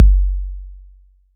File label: Kick 808 Tone1; gate experiments